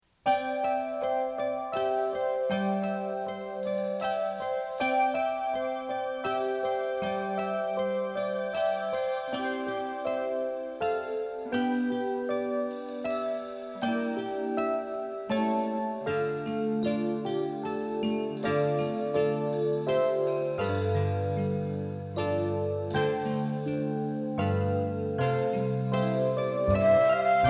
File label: accompaniment